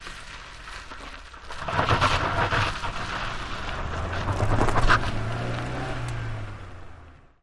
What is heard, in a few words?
Car Tires Gritty; Peel Outs, On Board Perspective 5x